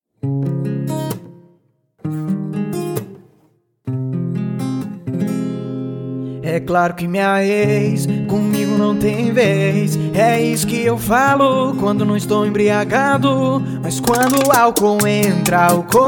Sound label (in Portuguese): sertanejo universitário sofrência